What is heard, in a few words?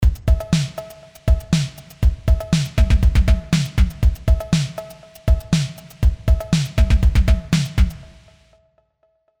CMI V Beat Rev LX 24 Subtle Perc Room